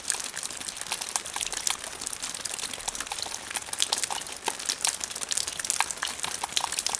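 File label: water pipe; Star Wars Knights Of The Old Republic I; XBOX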